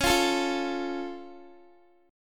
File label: Db Augmented, down, Guitar; strummed